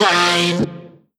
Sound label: Omnivox oneshot F, Vocal; One Shots; Slate Digital Omnivox Vocal Sample Pack